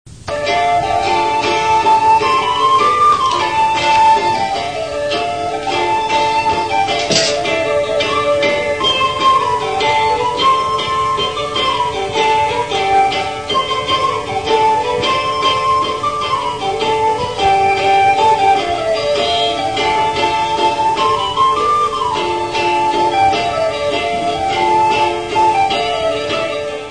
flauto

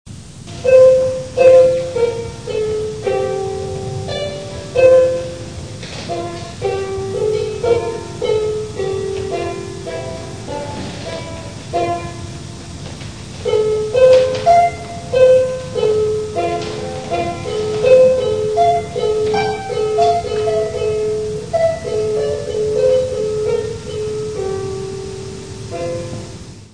arpa

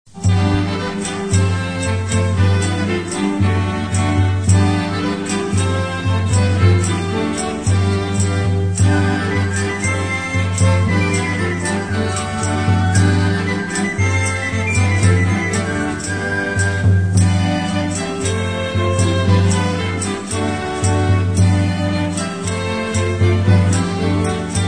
tamburel

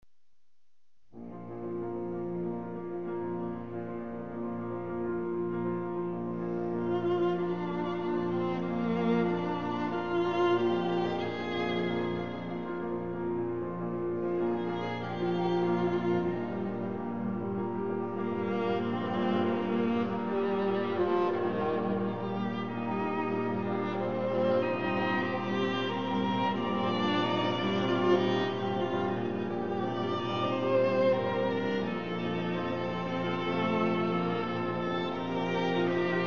Quintetto per pianoforte e archi

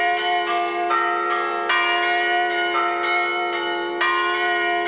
campanot

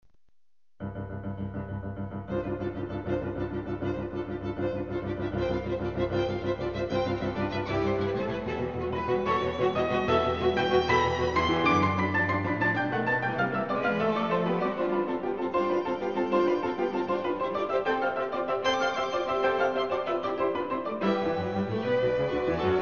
Quintetto per pianoforte e archi

